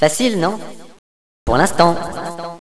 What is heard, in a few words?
kit; Villagina; ecole